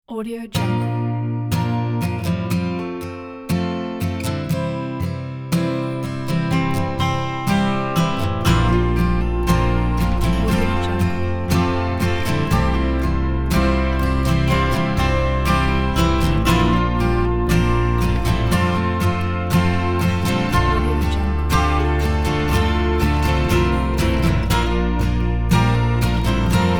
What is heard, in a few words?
sincere acoustic strum